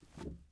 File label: wood jump